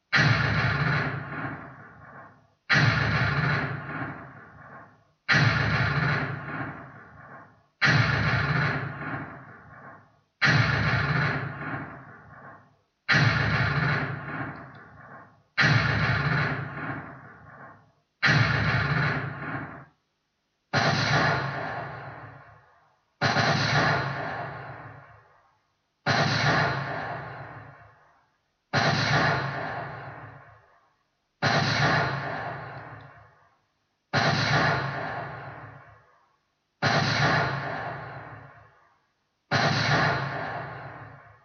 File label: gun